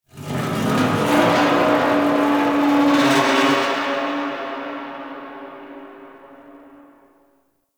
Stadium Metal Creaks Close; Warframe; Ambience; Nineteen Ninety Nine

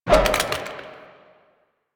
Water Balloon Fire; Warframe; Water Balloons

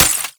Sapient Pistol Mech; Warframe

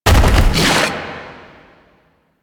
Containment Protocol Wall Build; Warframe; Warframes; Qorvex

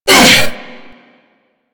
Hammer Boss Pain, Vocals; Warframe

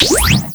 Fire, Weapons; Lato Prime

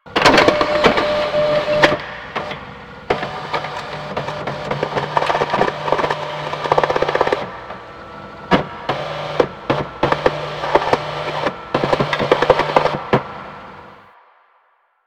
Office Fax Machine Misc; Warframe; Ambience; Nineteen Ninety Nine

